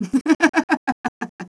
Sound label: LAUGH; BattleSNDS